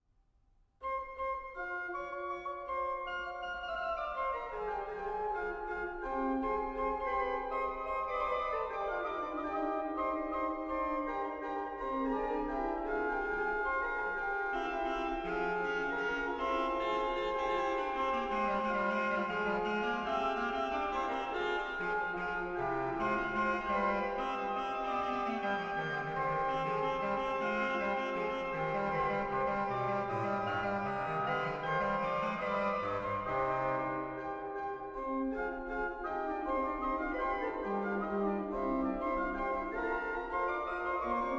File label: Magnificat Cromorne, organ; Dandrieu